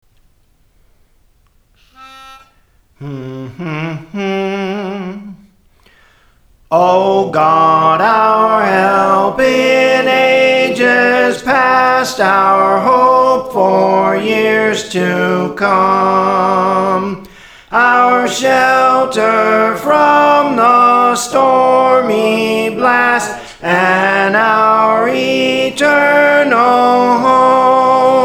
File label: Soprano, Songs; Praise For The Lord Training; O God Our Help in Ages Past; St Anne